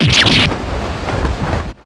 damage

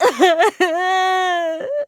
cry

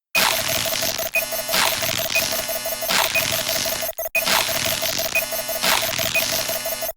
panicking

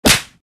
fist; athena

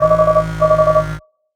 ringtone

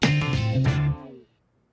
car sound; birdman

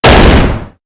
mega slam double

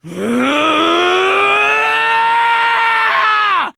scream

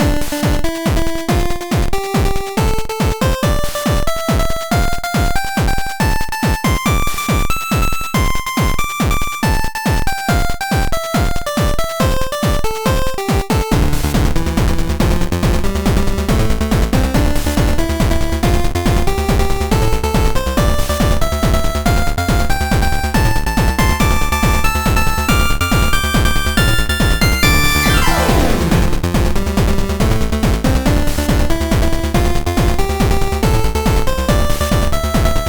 argument heat up (8-bit)

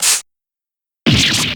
damage

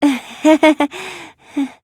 awkward giggles; ibuki

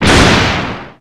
explosion

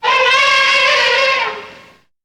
elephant

